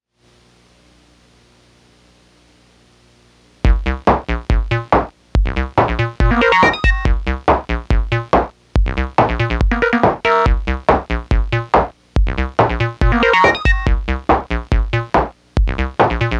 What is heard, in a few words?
bongo plano